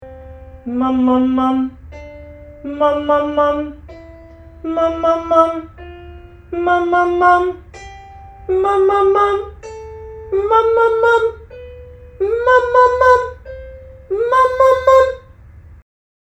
mum hiCまで

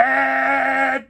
喉ベース; ガナリ発声; ２種類のガナリ発声とは？

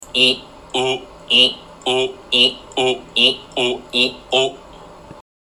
喉上げ下げ

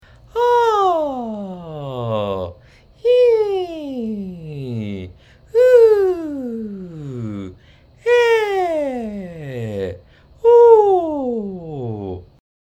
地声と裏声を繋ぐ

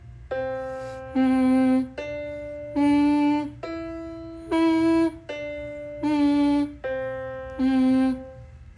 ハミング

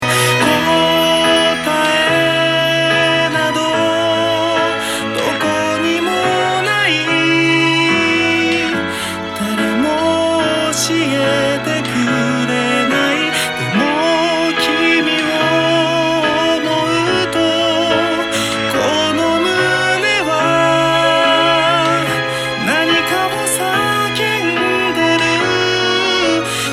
ファルセットミックス

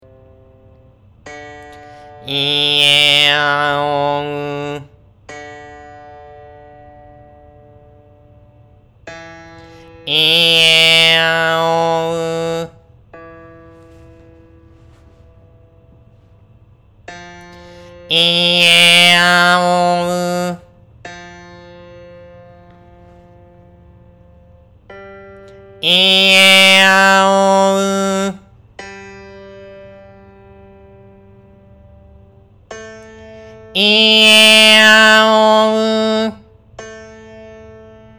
喉上げ 母音変化

